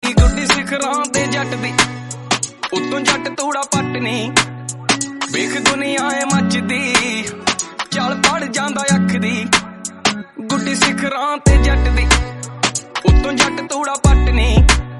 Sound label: Punjabi Song